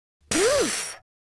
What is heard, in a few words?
relief; speech; Spanish